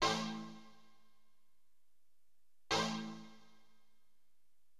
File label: STAB, AcidJazz Funk Fx; LiveAct Pool